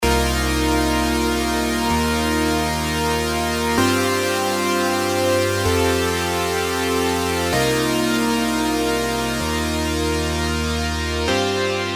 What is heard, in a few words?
Half Super; serum super saws